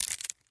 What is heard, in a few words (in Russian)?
хруст ветки; One Eleven Android